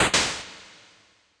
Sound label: Door enter; Pokemon Solar Light & Lunar Dark 1.0.1